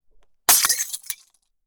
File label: Glass breaking